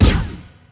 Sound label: sniper; suarian